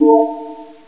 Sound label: Menu Popup; Windows 97; Plus!